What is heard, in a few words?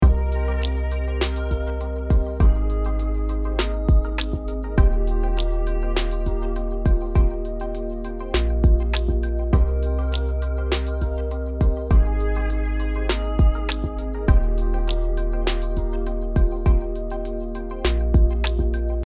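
loop inspirational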